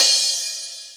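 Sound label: Closed Hi-Hat, Dance Drums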